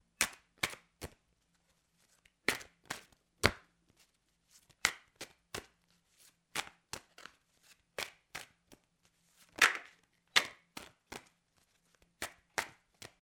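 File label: sound of playing cards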